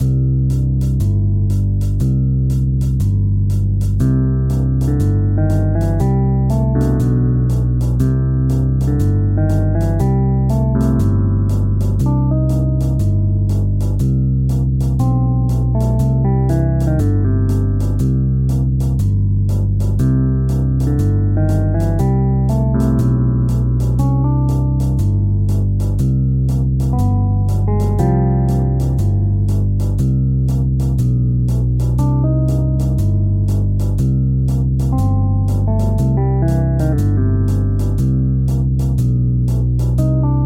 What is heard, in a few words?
Simple Blues; OpenGameArt; VViard